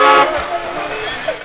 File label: Horn; Urban Shakedown